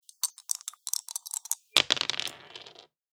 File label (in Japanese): ダイス 振ってから 投げる